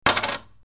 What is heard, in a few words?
coin